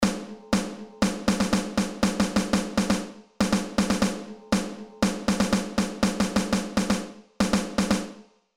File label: Rhythms Snare Drum